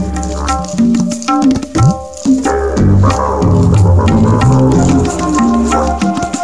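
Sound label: rhythm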